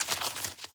shuffle deck

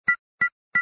bomb tick fast; agent 8 ball

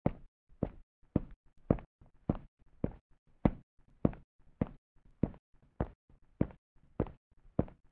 crouch

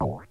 BOUNCE; commander genius

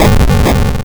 helicopter die; tanks of freedom; units

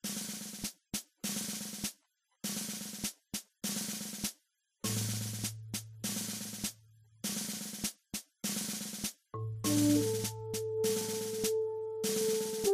dawning fanfare; freeciv

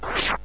sword spin attack release; zsdx

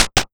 soldier move; tanks of freedom; units

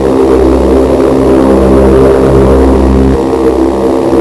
turboprop hipower; rigs of rods

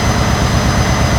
marine large; rigs of rods

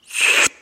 suck, whirlwind; edgar; enemy

